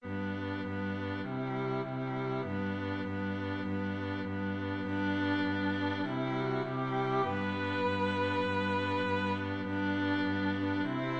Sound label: string quartet